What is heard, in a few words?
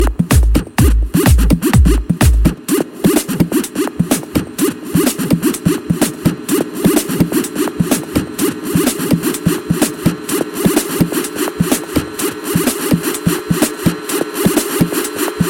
dutch electro ringtone